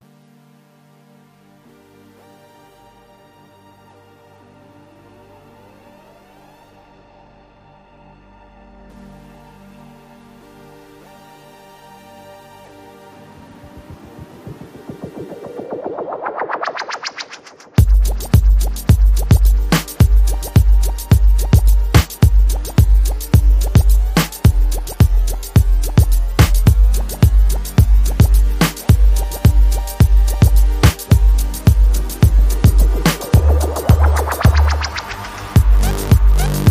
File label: Robastep, No Photo Clicks & Birds & Moaning